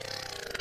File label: reverse ribbed scrape; There; ThereClient